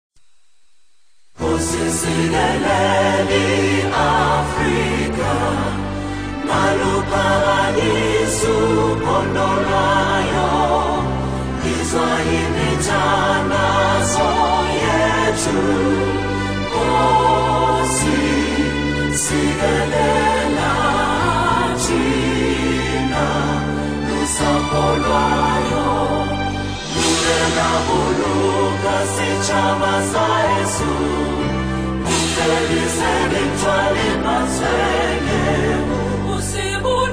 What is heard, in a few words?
South African National Anthem